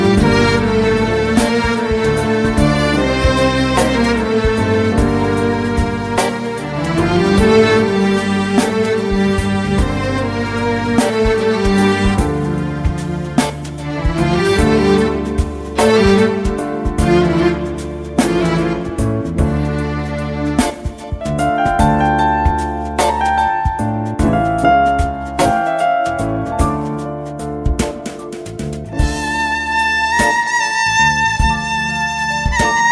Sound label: Violin